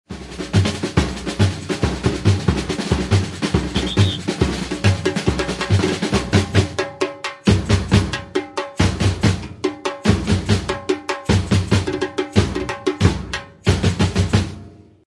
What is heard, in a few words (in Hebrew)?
Break, מעבר 3א בסיום; Batucada